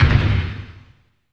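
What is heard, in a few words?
bass drum fx, perc fx; Zero G; Malice In Wonderland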